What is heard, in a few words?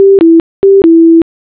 Dingdong